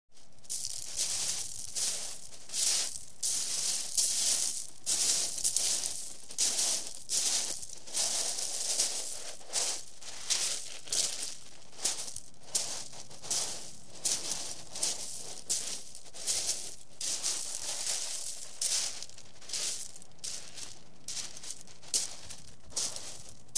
Footsteps In Shingle; Human Crowds, Children & Footsteps

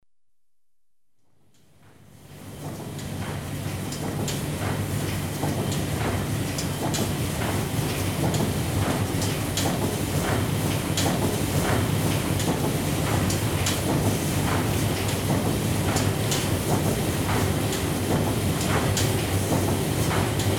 Engines, constant run, Ships & Boats; The BBC Sound Effects Library

